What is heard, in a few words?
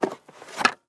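handheld vacuum cleaner sounds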